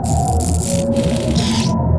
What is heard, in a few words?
Big Crystal